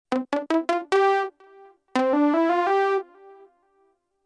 Attacks

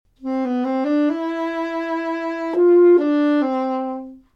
saxophone break

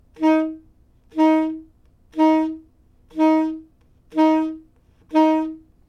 no crescendo

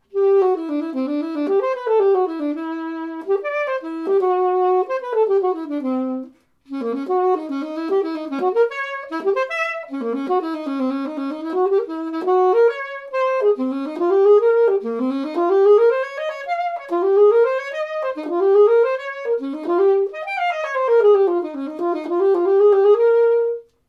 vandoren optimum alto

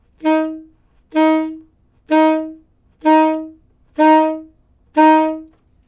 crescendo

